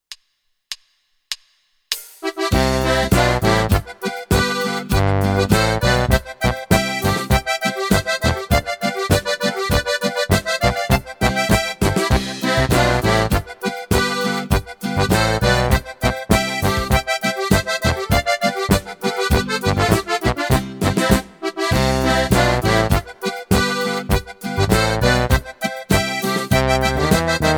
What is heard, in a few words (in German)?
Boarischer mit Melodie; Timple